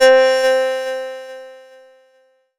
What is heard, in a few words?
Korg MS2000 Pluck Drone C4